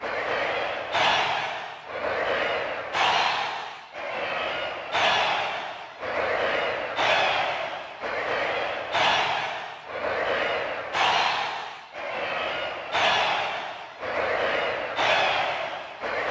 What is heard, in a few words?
Roshambo Game Cheer